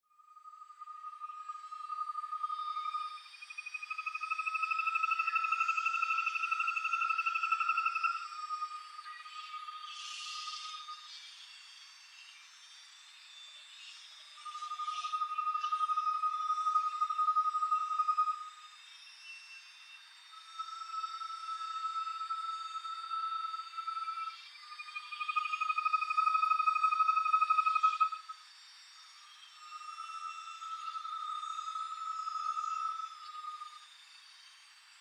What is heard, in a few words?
UFO; Geraeusche